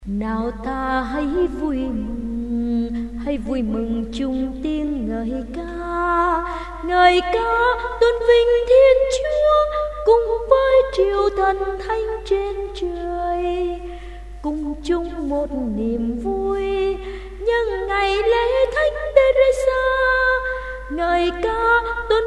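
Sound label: soprano tenor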